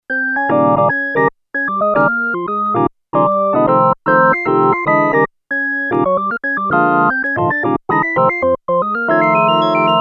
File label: organ b3, Kawai sx240